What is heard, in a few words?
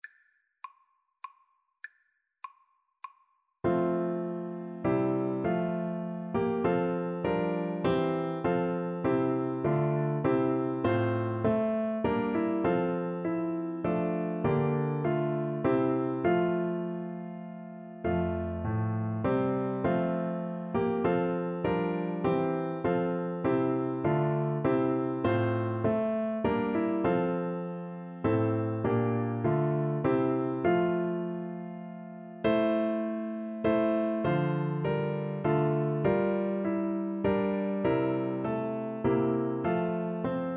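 alleluia sing, alto saxophone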